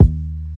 modern low kick sound d sharp key, kick tuned to the D# note; kick drum samples